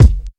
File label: short kick sound e key, kick single hit tuned to the E note. Loudest frequency: 192Hz; kick drum samples